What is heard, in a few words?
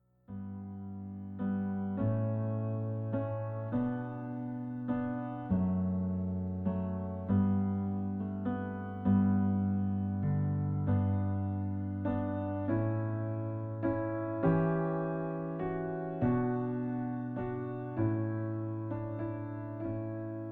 piano